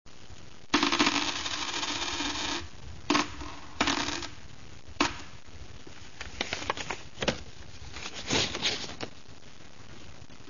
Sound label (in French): texture monnaie boulangere